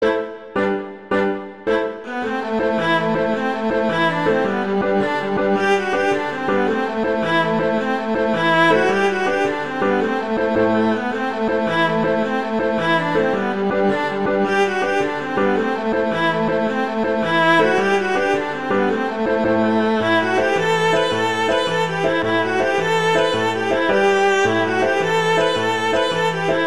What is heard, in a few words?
Swallow Tail Jig, Fiddle Vla Pf; Fiddle Collection